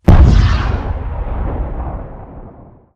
redeye weapon fire; Titanfall 2

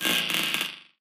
bullet energy titan shield spang cluster; Titanfall 2

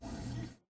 atlas servo sprint; Titanfall 2

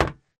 Grunt Mvmt FS Walk Solid Metal; Titanfall 2

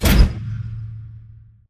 sliding door ext verb open start; Titanfall 2

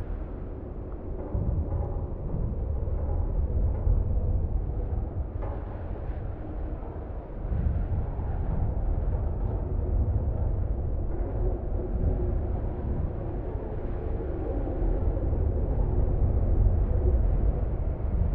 int small room; Titanfall 2

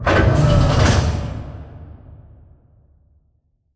Robot Arm Fold Plate; Boomtown; Titanfall 2

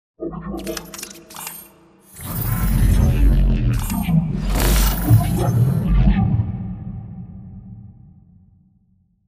pipe idle reverb; bt; beacon; control room; Titanfall 2

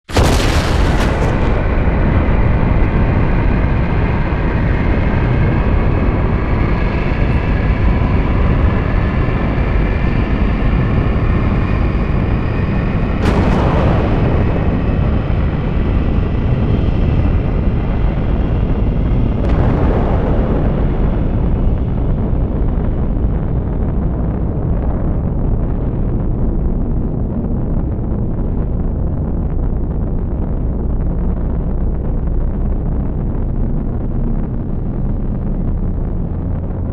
megacarrier takeoff; Titanfall 2

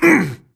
grunt hits; Titanfall 2

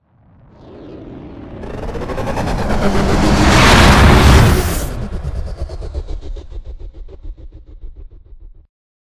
tackle start ascend; bt; viper; Titanfall 2

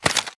ammo pickup; Titanfall 2

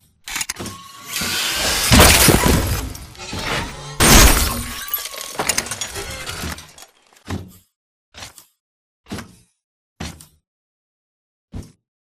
Victory Punch; Coliseum; Titanfall 2

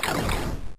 bullet generic titan shield scatter; Titanfall 2

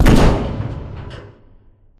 Large Concrete Wall Stop; Titanfall 2; Boomtown

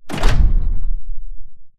footstep generic; Titanfall 2

